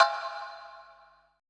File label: BLOCK E, PERC; BPC PERC